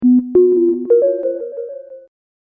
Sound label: Hip Hop Synth Licks 90 Bpm B Swing; In-The-Dark Swing Var A